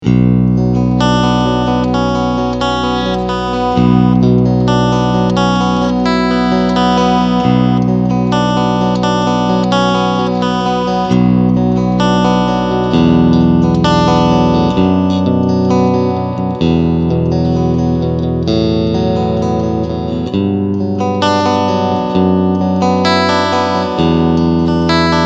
Clean Lick Steg Singlecoil

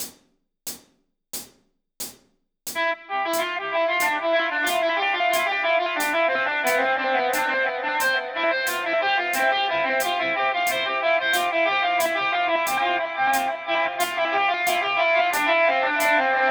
Coole Rhythmen mit Delay 90 BPM Dotted Eight Lead

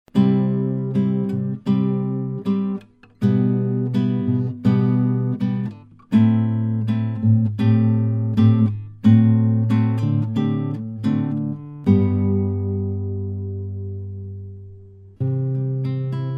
Shure SM4 mit Popfilter Akustikgitarre

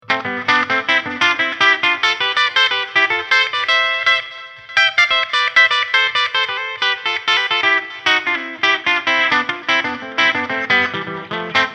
Terzen clean

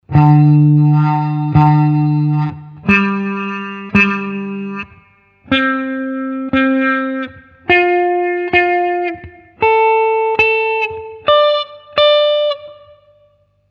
Schnarrer

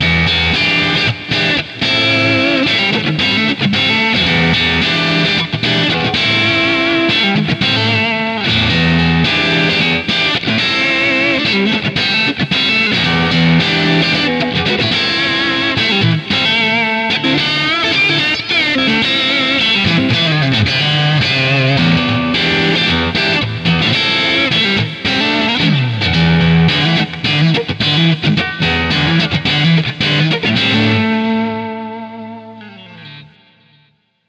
DI Aufnahme Treble Booster an Plug in

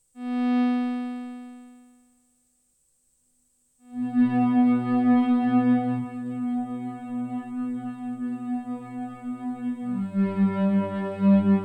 Chase Bliss Onward Microfreak Freeze Sub Oktave Error Chorus Modulation

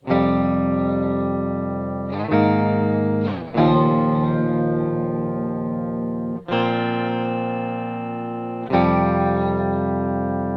Mooer Harmony X2 Quinten

